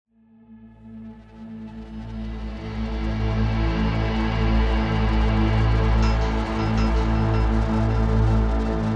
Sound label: tense music